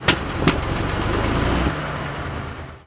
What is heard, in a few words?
exterior engine switch, tanks; War Thunder